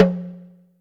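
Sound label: Talking Drum, Yamaha DTXpress Percussion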